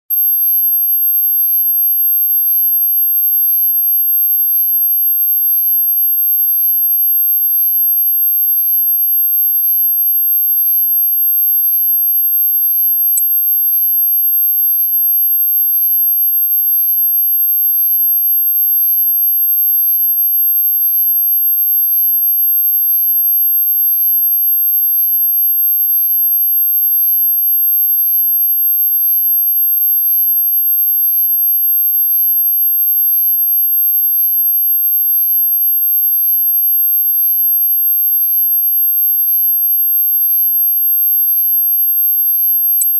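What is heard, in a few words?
komar moshk, mosquito gnat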